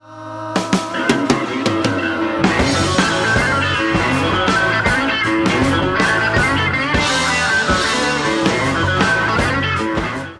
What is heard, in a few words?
leslie